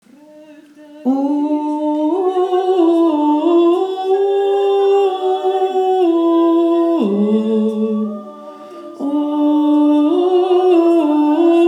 Licht geluid bas

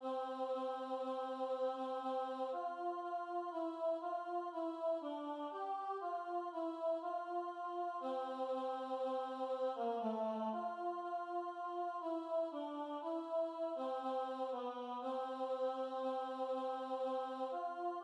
Hoor de englen zingen alt

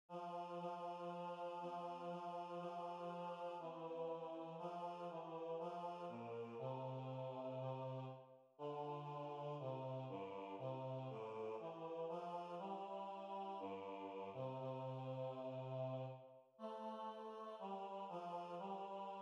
Komt allen tezamen bas

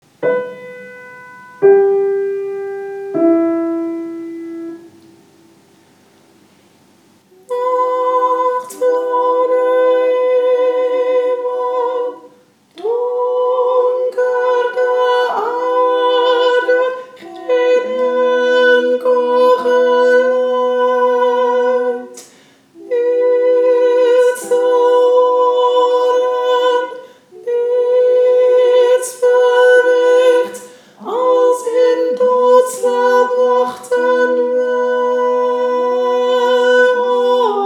Nachtblauw sopraan

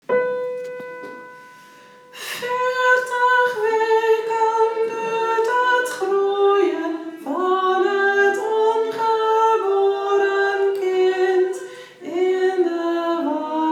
Veertig sopraan

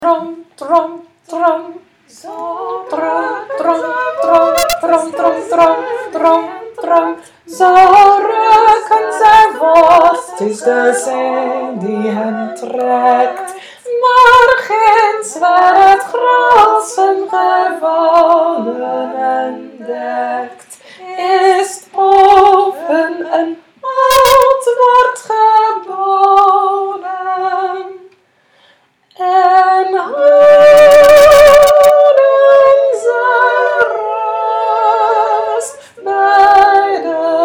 trom trom bas

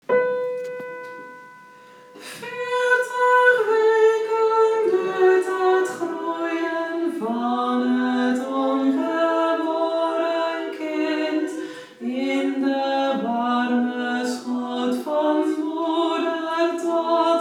Veertig alt

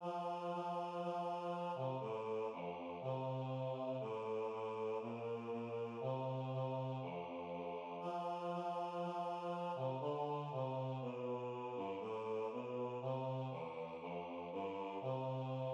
Hoor de englen zingen bas

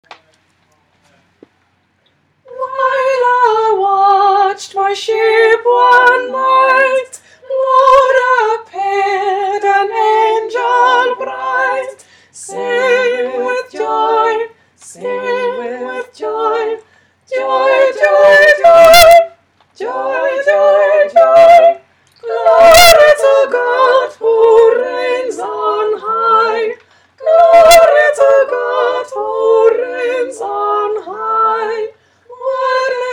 echo carol sopraan

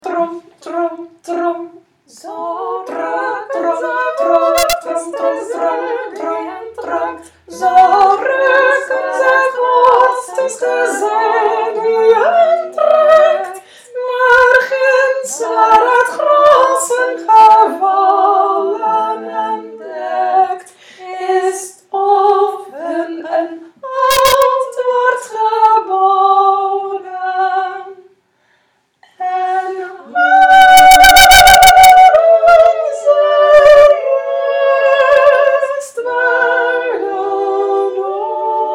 trom trom tenor

